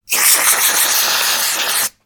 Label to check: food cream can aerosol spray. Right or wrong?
Right